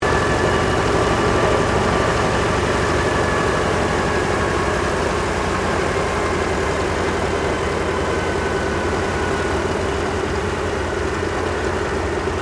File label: AIRCRAFT PBY CATALINA SHUTDOWN, Catalina Flying Boat; 1942; Airplane Sound Effects; Airplanes (Sound Effects:Vehicles)